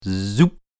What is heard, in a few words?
zoop